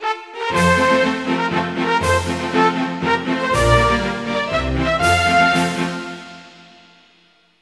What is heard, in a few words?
Greece, Fanfare; 1997 MMX LAN PACK (win 95); Worms Armageddon